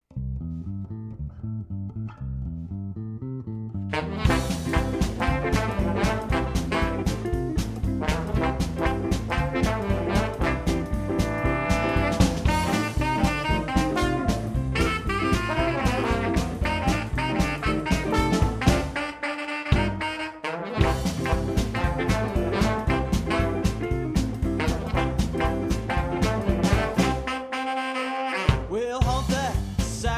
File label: Honk That Sax